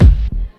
hq rich lows kick a key, Royality free kick drum sample tuned to the A note